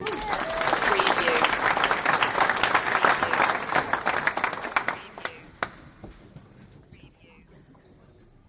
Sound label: Audience Applause; beanstalk audio